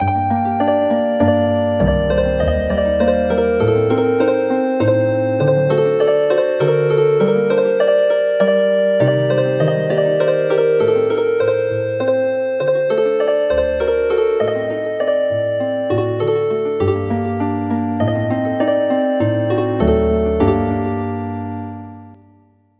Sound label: Healin Harp; The Sun Sets